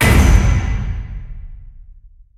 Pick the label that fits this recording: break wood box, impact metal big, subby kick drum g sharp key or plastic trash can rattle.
impact metal big